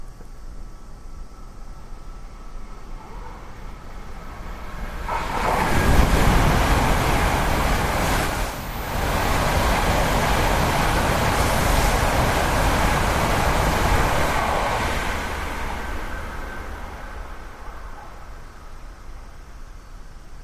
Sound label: train passes